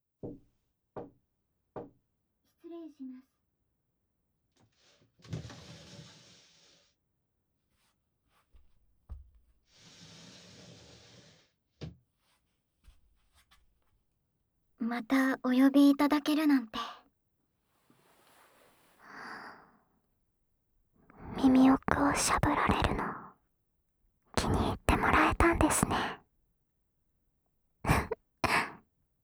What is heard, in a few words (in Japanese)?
耳 奥舐め しゃぶり & 手コキ; 耳 奥舐め犯しマスターお姉さんの耳“奥”舐めしゃぶり&手コキ